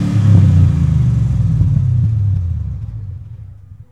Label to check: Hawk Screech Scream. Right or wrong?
Wrong